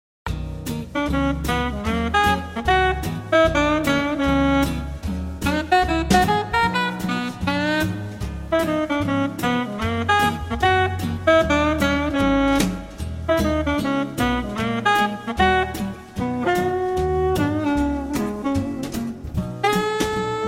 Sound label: Happy Music Vibes